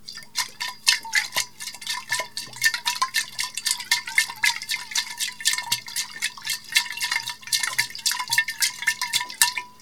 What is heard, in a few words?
Liquid In Bottle Shake